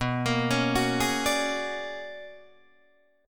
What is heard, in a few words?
B-Minor Major 13th B down Guitar DADGAD; arpeggiated